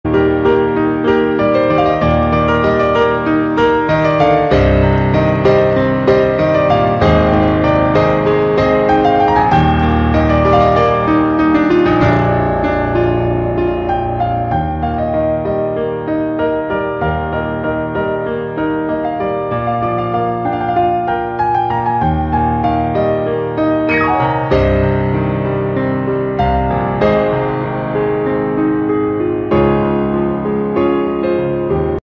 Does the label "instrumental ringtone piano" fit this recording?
Yes